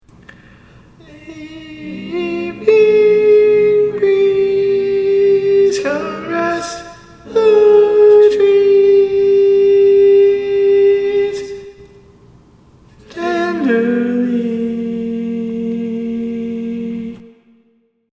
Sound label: barbershop tags